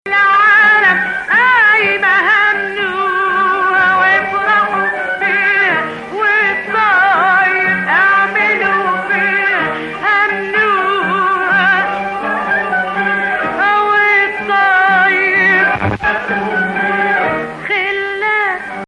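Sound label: Hijazkar Octav, Arabic music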